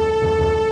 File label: music